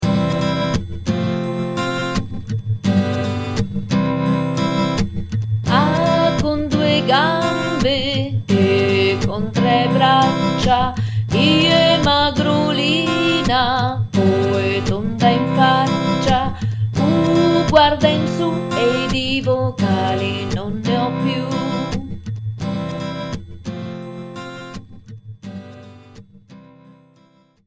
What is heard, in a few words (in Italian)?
filastrocca vocali voce